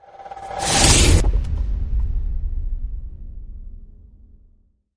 Hit Shred Jittery

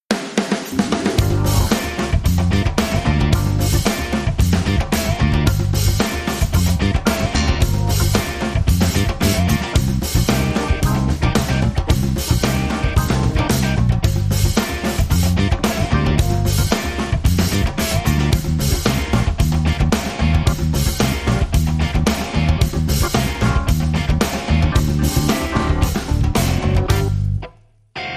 No Melody

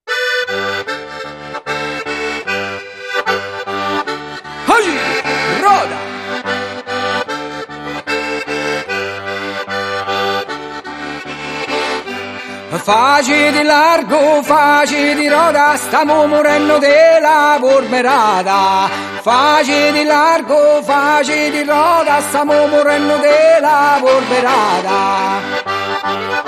Tarantella No Percussion

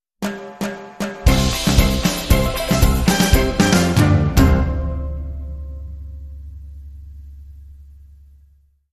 Caribbean Cool Sting

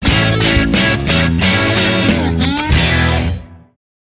Crisscross Funk, Sting

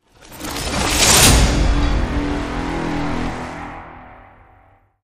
Hit Shred; Hit Shred Mutate

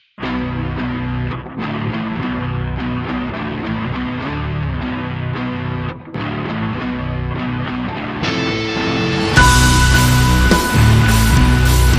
Ticking Timebomb

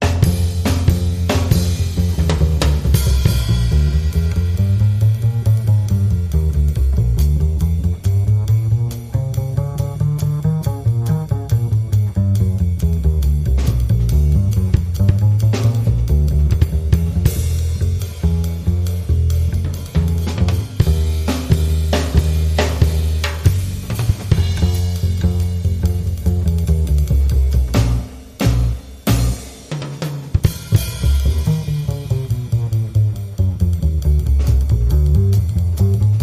Basic Jazz

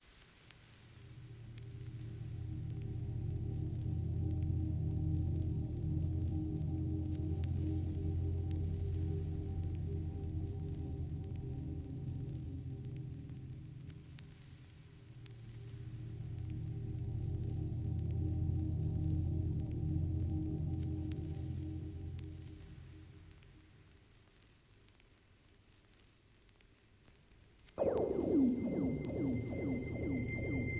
Pads Only

